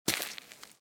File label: footstep gravel; A Western Drama